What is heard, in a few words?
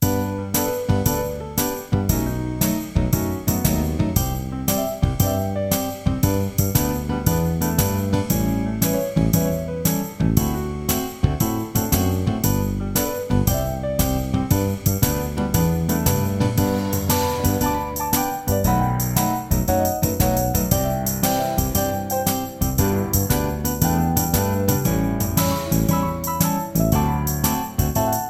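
Country Ballad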